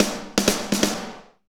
Medium Tekno, Drumscapes Roland; Northstar; Med.Techno

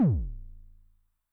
BD MOD [Pitch+Depth+Overdrive], 909mod, BASS DRUM

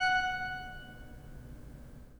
pipe organ, 3-D Audio

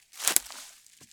Crunchy Step, outdoor, Footsteps

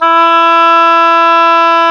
oboe, mf f; Roland L-CDX-03 Disk 1; Oboe 2&3

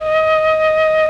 flute D, BRASS+FLUTE; Best Service ProSamples vol.55 - Retro Sampler; AKAI

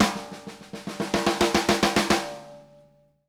DYN SNARE L, drum fills; Sampleheads - New York City Drumworks VOL-1

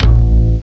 DBLBASS; CMI Sound Library Rev 1.3

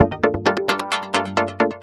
NRG Pad; Pads; Trance; Sonic Foundry (Sony Creative Software); Trance NRG VOL-1

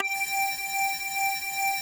SYN G5; Best Service Gigapack 1

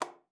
F4, alto sax; Xsample VOL-2

